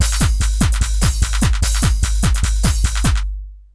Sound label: Lo-Fi, Essential beats; FM 57 - The Ultimate Sample Collection (1997)